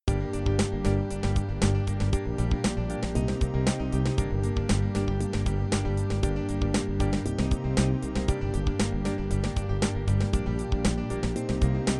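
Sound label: Gm Pentatonic Accelerate 117 bpm; no loop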